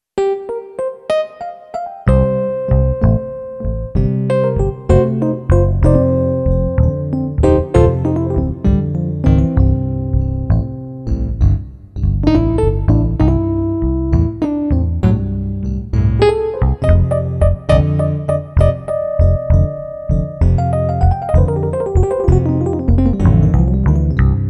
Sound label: Blues Doodle